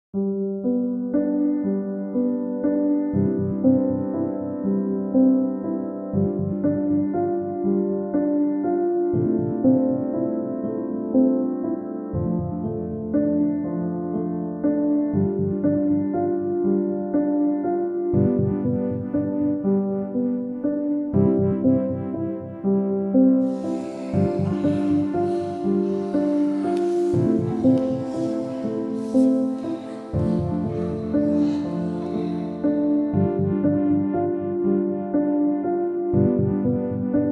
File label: Tantra Music